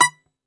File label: Greek Bazouki, plucked strings